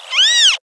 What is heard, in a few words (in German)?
rohrweih